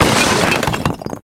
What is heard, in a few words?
bomb; Titan